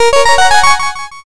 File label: oneup